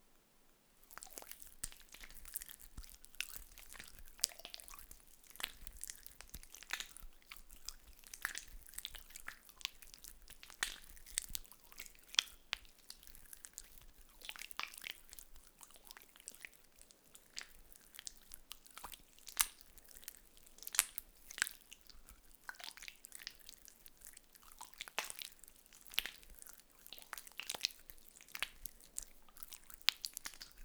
Slimy; Lewd SFX

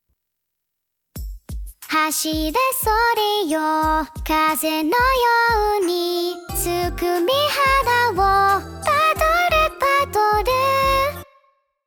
padoru padoru, Singing; Neuro-sama; Virtual YouTubers

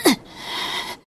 Grunt; Lewd SFX

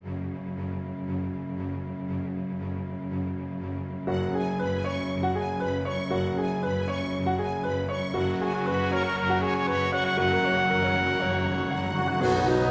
Holiday Orchestral Rock Remix

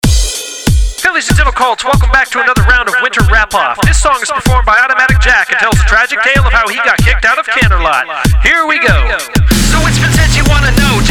Rap

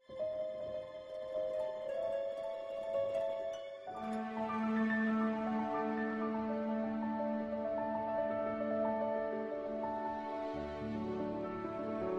[F] singing, musical theatre; [For Penny's Broadway Collab]

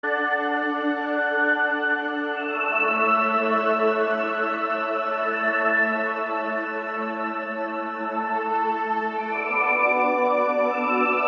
Theme (Resonant Waves Ambient; MLPFiM

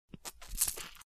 Suck; Lewd SFX

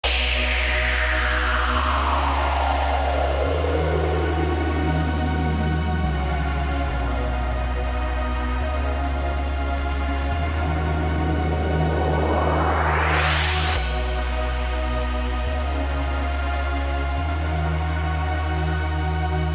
Uplifting mix; Traders Excange

